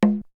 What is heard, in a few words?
Perc; ZERO G - Datafile DISC 1